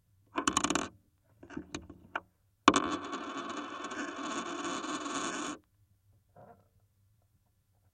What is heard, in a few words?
Spin Test